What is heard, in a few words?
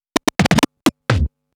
Scratch Fills 140BPM